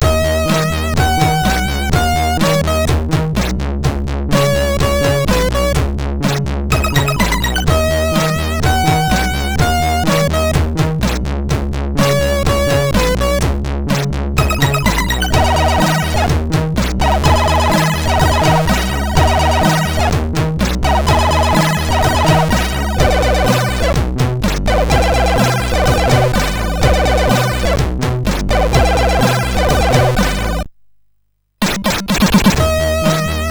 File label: intro, MOS6581R4